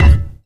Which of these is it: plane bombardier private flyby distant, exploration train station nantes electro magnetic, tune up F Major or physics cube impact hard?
physics cube impact hard